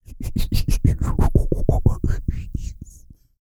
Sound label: FREE PUFFS, Vocal Blues & Soul